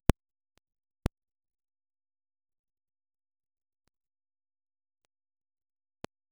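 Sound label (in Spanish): un puño golpeando a un, fighting game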